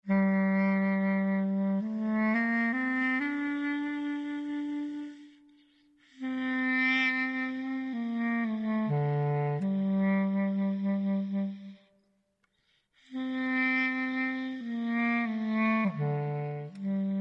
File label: Dialog; the walking dead season one